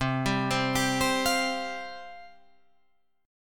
C Suspended 4th C Guitar Drop D

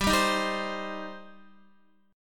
G Suspended 4th Sharp 5th, Guitar; Gsus4#5; strummed; x,10,7,8,7,8; 4F,4A,5C,5G,5C,6E

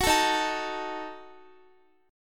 Eb Diminished, Guitar; Ebdim strummed

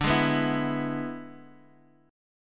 E-Major D

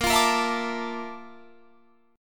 Bb Major Sharp 11th Bb down Guitar Drop D, chord

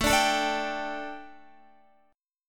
A 7th Suspended 4th Guitar, chord; A7sus4 strummed

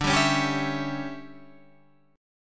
D Major 7th Suspended 2nd Suspended 4th, chord

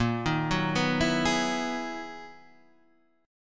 B Major 13th A sharp, guitar

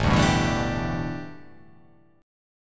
A 9th Suspended 4th, A9sus4 chord; strummed; JGuitar

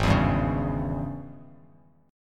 Ab Major 7th Suspended 4th Sharp 5th Ab down; strummed; JGuitar

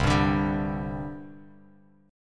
C Suspended 2nd, C, down, Bass Standard; Csus2 strummed

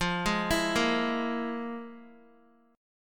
F Minor Major 7th Double Flat 5th Guitar; FmM7bb5 arpeggiated; 4F,4A,5C,5G,5C,6E; x,8,8,9,10,x

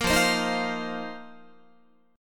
F 7th Suspended 4th F down Guitar; strummed

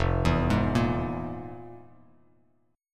F Minor Major 7th Double Flat 5th, Bass Standard; FmM7bb5 arpeggiated; JGuitar

